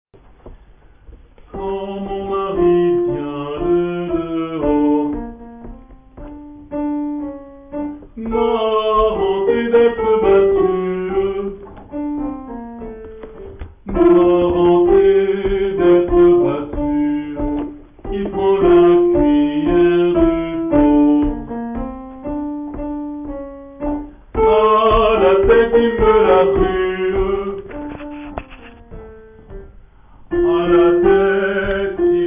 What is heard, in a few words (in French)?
Quand mon mari Alti